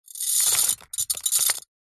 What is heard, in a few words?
small stones debris drop onto metal; foley